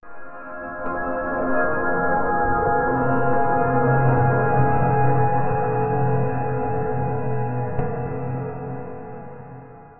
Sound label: soft electronic shimmerin; shimmering